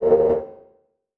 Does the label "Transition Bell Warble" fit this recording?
Yes